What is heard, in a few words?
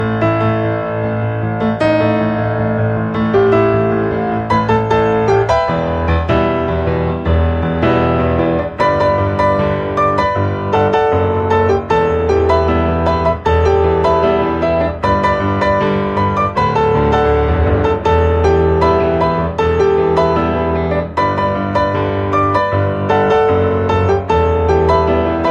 Piano Ringtone; Samsung